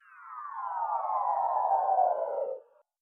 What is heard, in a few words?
swoooo